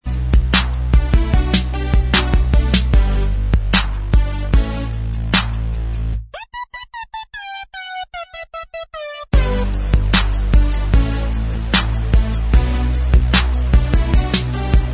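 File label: instrumental, backing track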